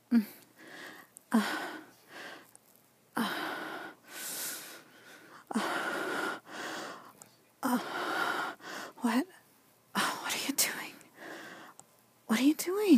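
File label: [F4M] gets gagged [gag][gag sucking], female; [DDmg]; [quicky]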